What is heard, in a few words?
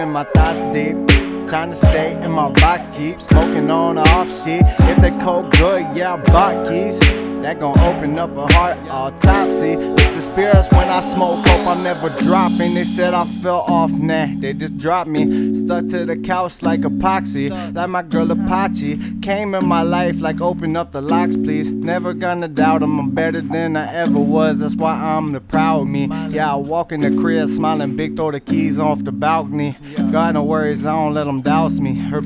raw vocals unmixed